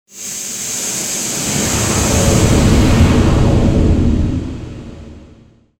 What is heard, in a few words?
Air Lock